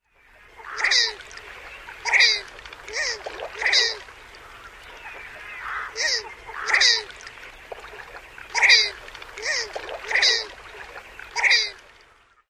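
REBRME male courtship calls, red-breasted merganser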